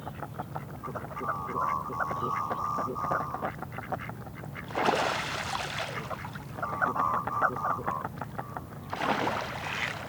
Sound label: Typical mergansers, مرگوس